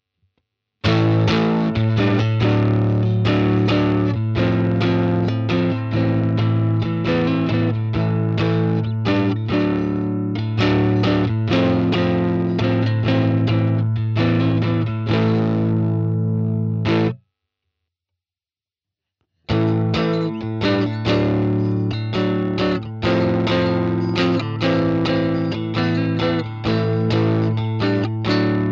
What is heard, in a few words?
VOX Fender Riff Chords